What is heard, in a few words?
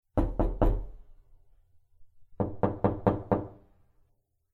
delay knocking